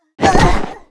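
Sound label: Npc Ship red harpy damage Fly; Fly For Insanity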